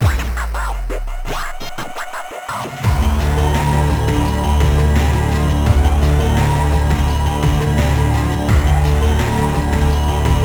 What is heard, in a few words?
Music Level Boss; Warframe; Caliber Chicks Minigame